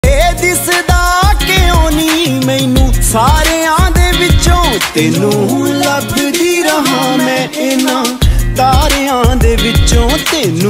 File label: punjabi ringtone